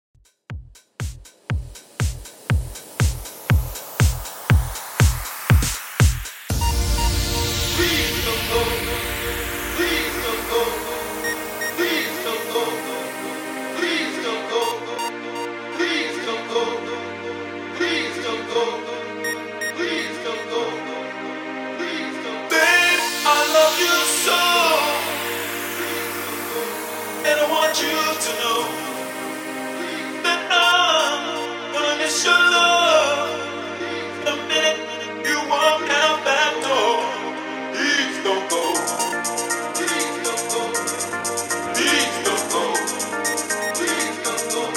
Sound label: 90s Classic Extended 120 Bpm; djyan